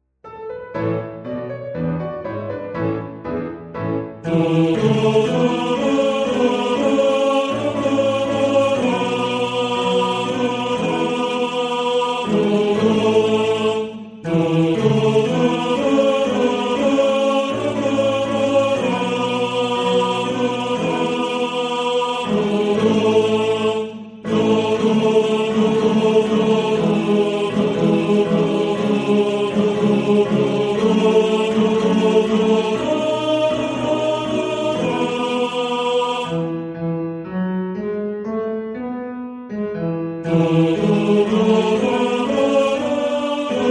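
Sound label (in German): Tenor 2, Männerchor; Soldateliedli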